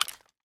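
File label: inventory slot; STALKER; IWP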